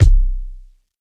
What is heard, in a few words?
phat steel kick drum C key